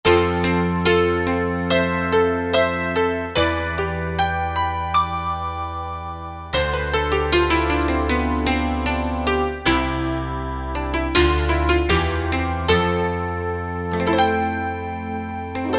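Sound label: Acoustic Piano Hybrid, Roland JD-990; Factory Patches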